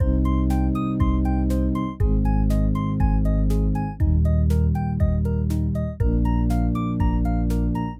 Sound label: neon pulse 120bpm loop